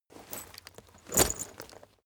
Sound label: leather metal impact chink